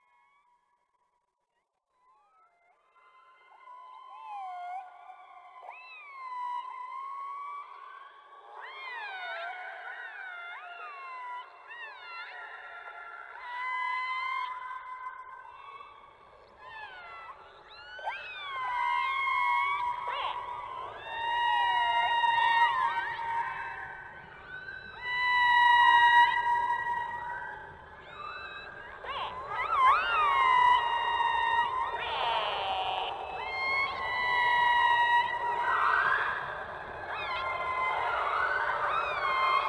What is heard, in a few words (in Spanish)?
Orcas: sonido biológico.; subacuatica